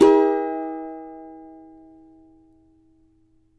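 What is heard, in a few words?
F major, Guitars; Spectrasonics; Hans Zimmer Guitars VOL-2; CAVA CHORDS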